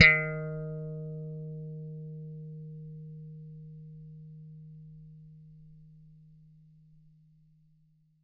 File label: GOOD 80 PLUCK D#3, bass; Bass Mace; DirectWave